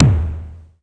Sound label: roomy kick single shot D# key; WAV (19531 Hz); kick drum